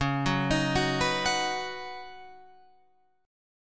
C sharp 7th Suspended 2nd Suspended 4th, C sharp, down, Guitar, Open F; arpeggiated